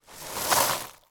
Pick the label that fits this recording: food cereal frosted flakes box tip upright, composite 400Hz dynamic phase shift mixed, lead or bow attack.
food cereal frosted flakes box tip upright